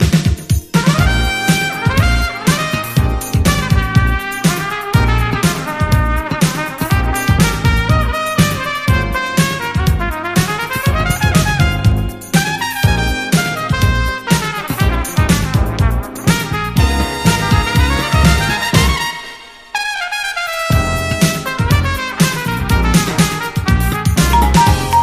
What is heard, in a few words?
JAZZ RAP INSTRUMENTAL MIX